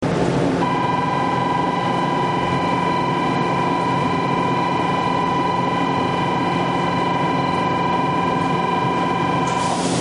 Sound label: hassya; gotemba